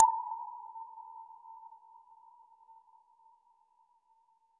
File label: warm tight tap, device